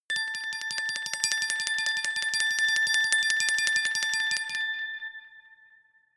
glockenspiel sparkle tremolo low octave; percussion

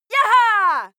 female shout yeah; menschen

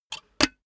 cash box metal lid close hard; maschinen; soundpool

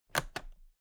toolbox latches close regular

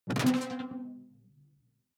sci-fi alien bounce detuned bright

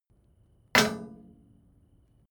metal impact short loose; hits explosionen; metall

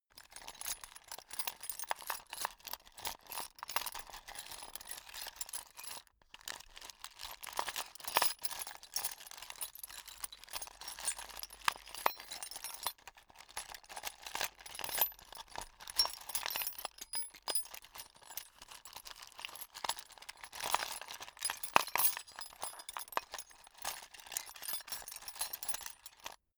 sundries rummage; soundpool

